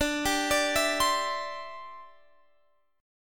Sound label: D 7th Suspended 2nd Suspended 4th, Guitar Open F; arpeggiated; x,21,19,21,19,19; chord